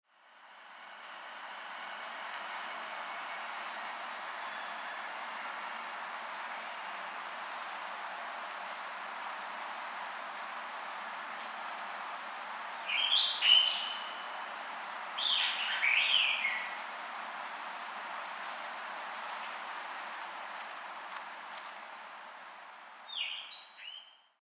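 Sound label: isohiyodori, Blue Rock Thrush; kunitachi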